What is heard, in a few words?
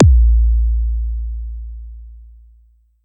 Tempest 808 Style Kick, Drum Machines; 300 Drum Machines; Dave Smith Instruments